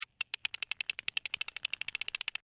Fishing Rod, Reel, Clicks; Sports; Fishing Sound Effects

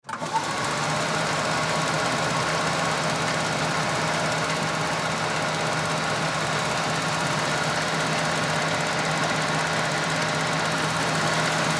Floor Buffer Turn On Run Turn Off; Factories; INDUSTRY; Blastwave

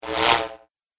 Laser Whoosh; Whoosh Sound Effects

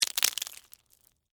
Texture, Debris, Waffle, Cones; Debris (Sound Effects:Materials)

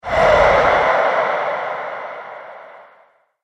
Ghost Monster Voice Gust; horror; Ghost Sound Effects

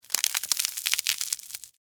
Crunch Pretzel Stick; Texture; Crunches; Objects; BlastWave

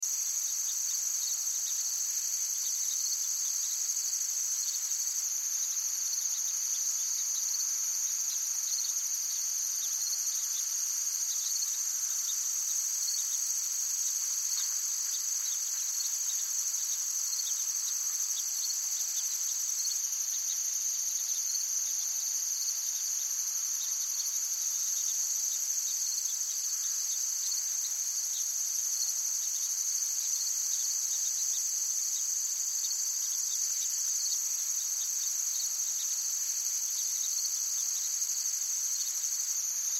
Forest Active Heavy Insects Birds Loop; Nature; Forests And Jungle; Ambience; Blastwave

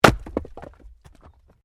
Wood Chopping Axe

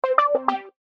Chime Musical, Chime Musical Multimedia; Web Interactive; Chimes (Sound Effects:Multimedia)

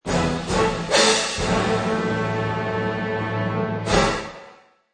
Orch Finale; Orchestra Finale cadence hit

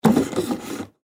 Foosball Table; Foosball Table Players Triple Move Out In Soft; Sports

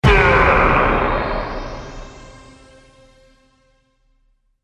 Trailer Hit Monster; Trailer Sound Effects

